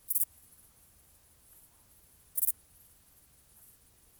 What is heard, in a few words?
cricket